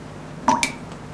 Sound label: Water Drip; NightFall